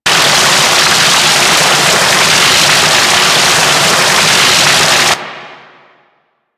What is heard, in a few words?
Ocean Water Rush; Ambience; Warframe; Grineer Ocean